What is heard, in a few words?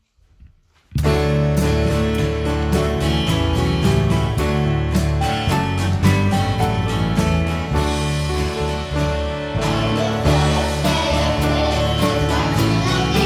Twelve Days Of Christmas vocals, choir